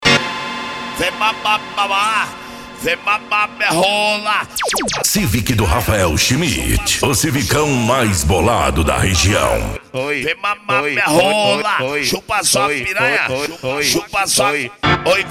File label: funk mega bass